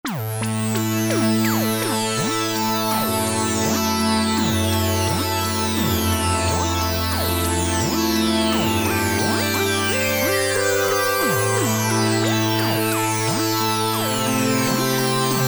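String, Memorymoog Classic Analog Polyphonic Synthesizer; 21 String 3; Factory programs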